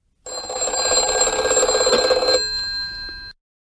phone ringing

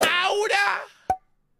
aura la cobra, aura super saiyan

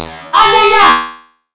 robot

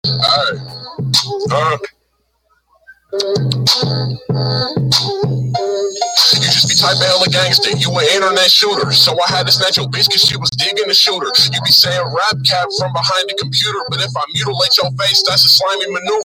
rap

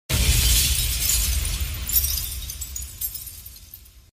glass smash

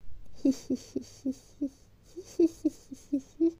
heehehhehee

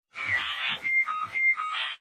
down slowed, slowed down time effect; fortnite

